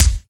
clean bass drum sound a key, Royality free kick single hit tuned to the A note; kick drum samples